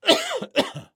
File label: trader male neutral cough